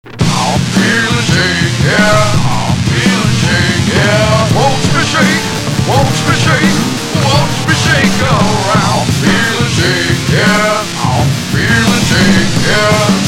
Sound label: tape